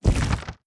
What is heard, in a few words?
swarm station explosion small; weapons; Stellaris